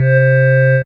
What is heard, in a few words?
Casio MT-600 Pearl Drop C3